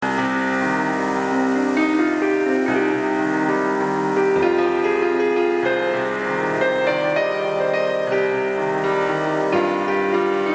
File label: Piano